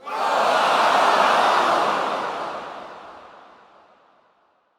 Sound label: Point Missed Crowd, Lunaro Crowd